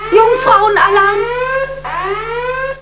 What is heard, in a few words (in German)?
alarm; spacebal